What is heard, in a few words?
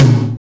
TOM, Drum Dry; East Collexion; Drum 1